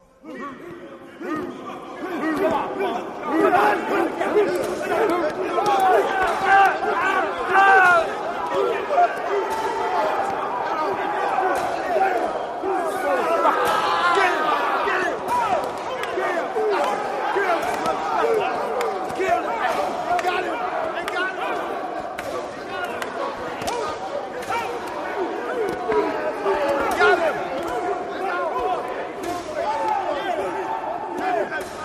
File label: Prison Riot Walla Hit